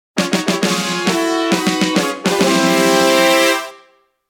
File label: Victory!; Hanjuku Hero vs. 3D